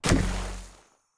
Player Geyser Reactor Burst; Mountain SFX

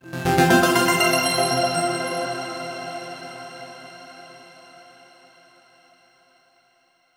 Overlay Tone; Act2 Rezoning

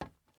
glass step; teardown ps5 windows xbox series xs gamerip 2022

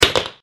Doorlock; SFX; Floor2; granny chapter two; android

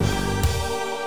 Church End; ultimate mortal kombat 3 j2me gamerip soundtrack mobile gamerip 2010

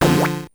multiplier D4 F4

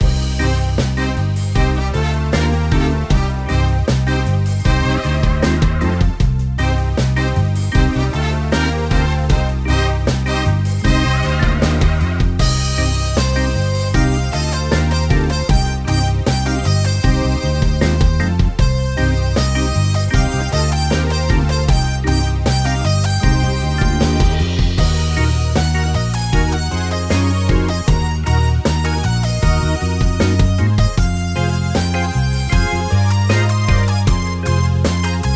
BGM UI Map Menu Music; Bubsy: The Woolies Strike Back

